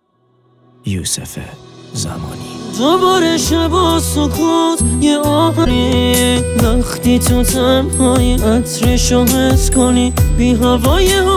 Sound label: A Sad Song